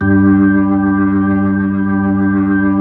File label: B-3